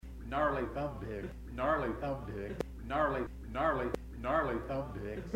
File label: Gnarly Thumbpicks